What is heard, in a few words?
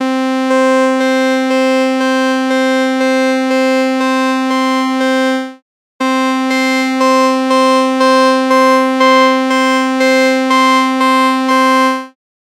string ensemble wrong